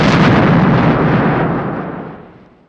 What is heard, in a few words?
explosion; gfuel